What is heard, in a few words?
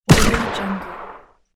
resolve shot